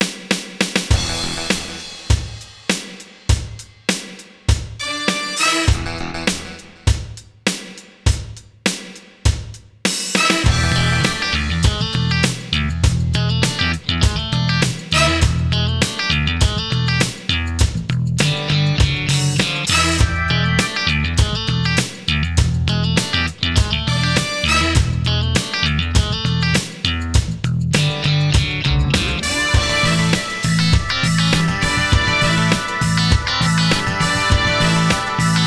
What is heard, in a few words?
funky 70s